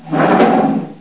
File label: GROWL, Dieren; sound effect